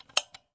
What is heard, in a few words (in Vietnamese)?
Am thanh click chuot